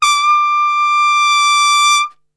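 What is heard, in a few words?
D 4 trumpet; Best Service; Brass Super Section; TRP SWL; 90 s Sample CDs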